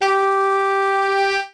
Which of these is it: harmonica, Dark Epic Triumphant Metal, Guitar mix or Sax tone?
Sax tone